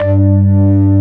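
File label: org lite, organ light; E-MU Formula 4000 Series Vol. 5; Protozoa; Orbit Presets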